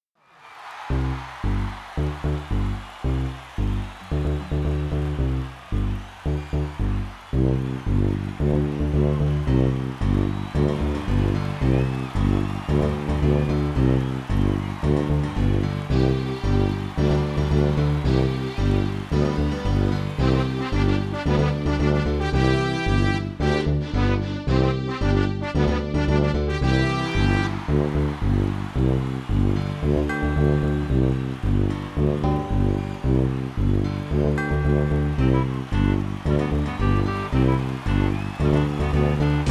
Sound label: Troy jazz Moment